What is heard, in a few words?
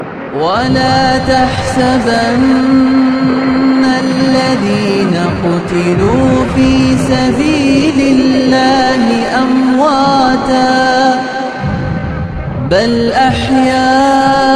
islamic, naat